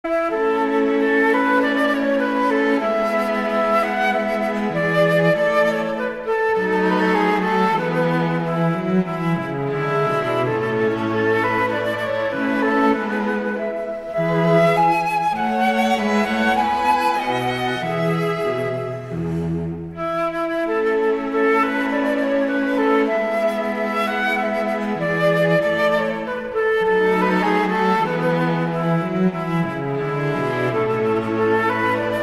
Flute Quartet in A major; Mozart, Wolfgang Amadeus; K 298

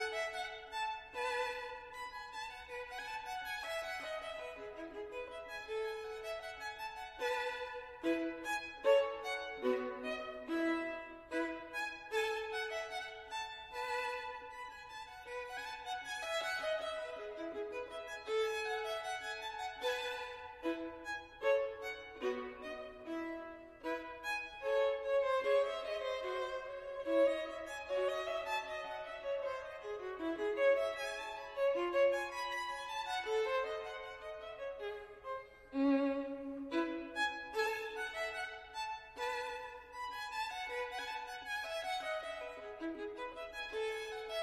Gavotte en rondeau, Violin

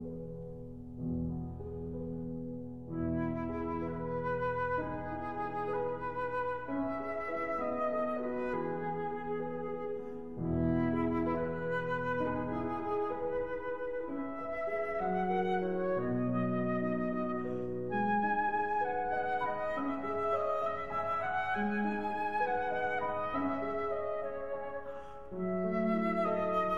Berceuse (Lullaby) for flute and piano

